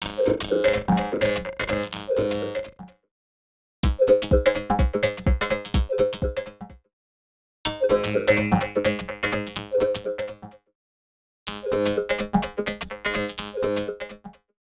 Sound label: Electro 126bpm; X-Static Goldmine 5